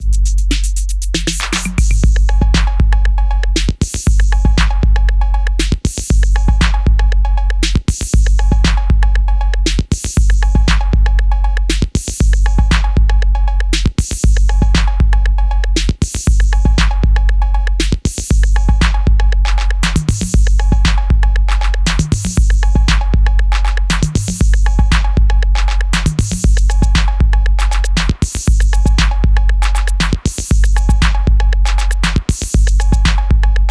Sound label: 808 simulator